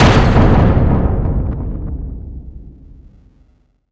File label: foot midfar